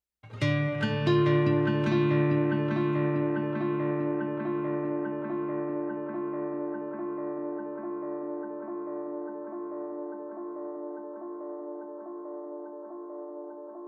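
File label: Ground Acoustic guitar Arpeggio; Ricosuite; Just Cause 3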